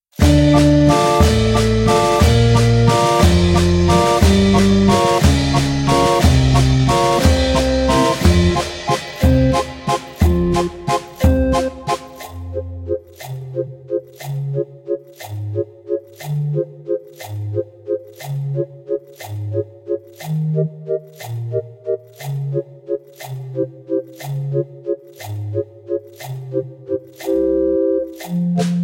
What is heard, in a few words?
karaoke